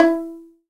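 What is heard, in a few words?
violin pizzicato E 3; AKAI S-Series CD-ROM Sound Library VOL-7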